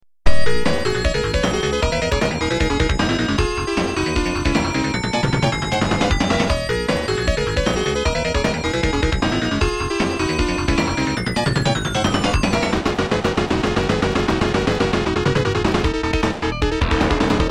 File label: cart music boss